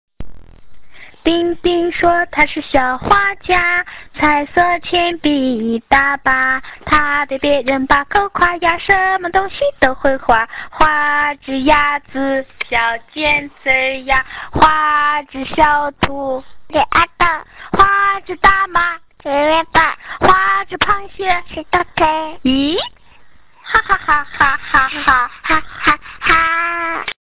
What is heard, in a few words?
Ding